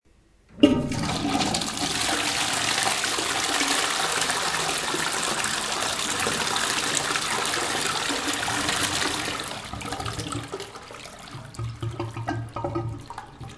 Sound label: toilet flush